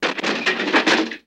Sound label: Car Crash Light